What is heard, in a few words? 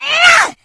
rage at victim, infected female; npc; Left 4 Dead 2; Source Engine